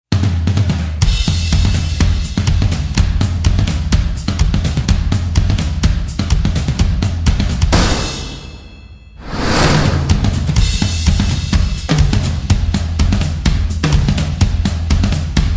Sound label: Shaka Toms